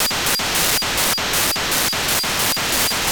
Ledsat 435MHz190 9k6 GMSK AX100 Mode 5 beacon, satellite; LEDSAT-1; On December 6th 2022 at 21:25 UTC Daniel DL7NDR received on 435.190 MHz the 9600bd GMSK be